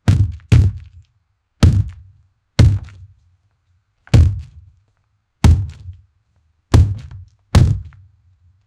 heavy greasy thud thud

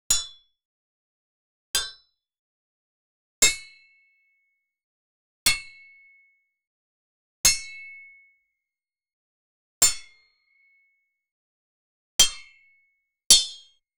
cartoon sword hit sound, clang

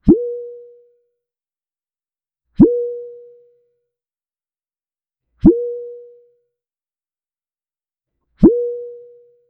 a short cartoonish swoosh

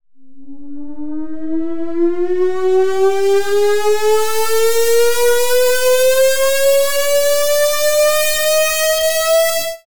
house music fade instart

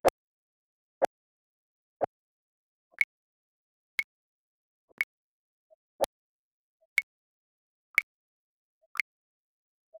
pov blinking eyes cinemat

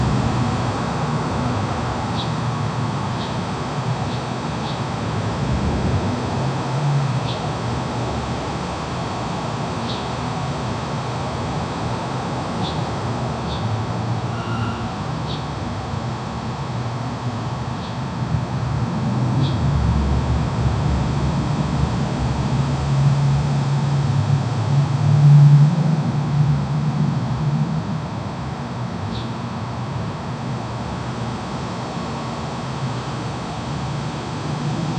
there is the sound of, classroom

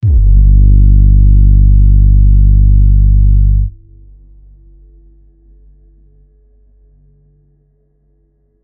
deep dark drum and bass

